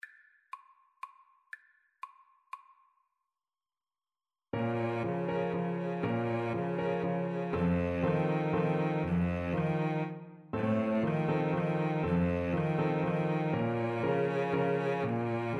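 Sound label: trumpet cello piano, Trumpet-Cello Duet; la partida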